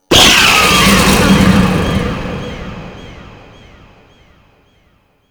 Vay Hek Frame Jump Attack Roar, Vocals; Warframe

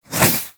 Warfan Whoosh, Weapons Melee; Warframe

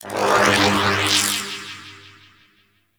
Shield Electricity; Warframe; Volt

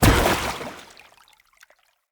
Blunt Heavy Impact Water, Weapon Impacts; Player; Environment

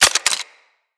Revolver Pistol Reload Eject; Weapons

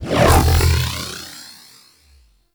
Eruption Enemy Lift; Warframe; Iron

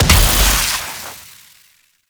Invisibility Proj Explosion; Warframe; The New War; Drifter; Abilities

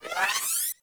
Biped Railgun Charge; Warframe; Corpus; CorpusRailjack

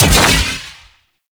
Explosive Impact; Weapons; Sapient Primary Explosive Impact D; Warframe

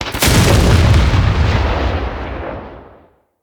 Tesla Shot; Encounters; Ode Pollution Tower

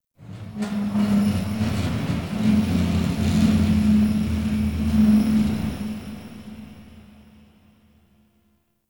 Corpus Ship Metal Movement Texture Large; Textures

